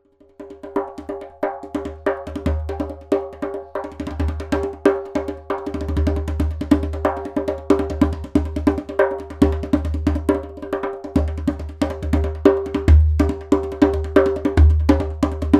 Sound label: djembe